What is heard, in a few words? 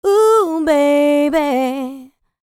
licks, vocal, dance; ILIO; Vocal Planet